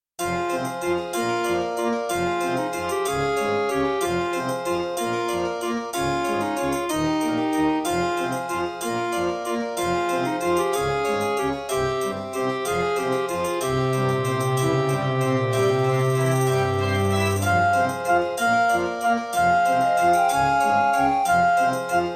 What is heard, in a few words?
Roland SC-88ST Pro; Death Gate